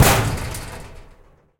locker Smash

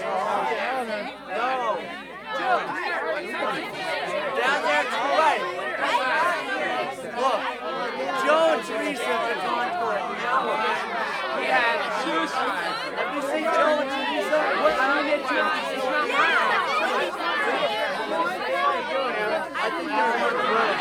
teenage Crowd Loop

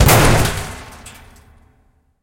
locker Smash

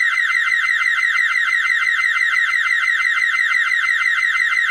fire Alarm Reverb Loop; SnootGame

